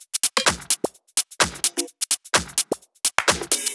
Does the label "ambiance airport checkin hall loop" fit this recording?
No